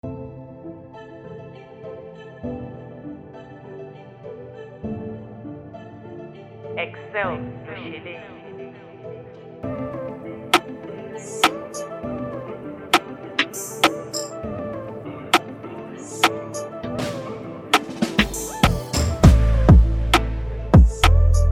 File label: Type Beat, afrobeat instrumental